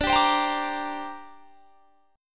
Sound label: D 7th Suspended 4th Sharp 5th down, chord; D7sus4#5; strummed